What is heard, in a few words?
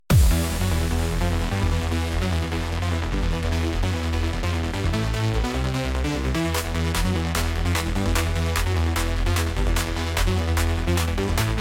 crushing bassquake, bass music